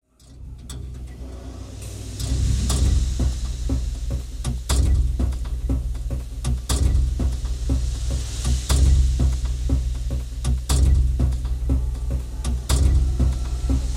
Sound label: cell phone ringtone; Ligna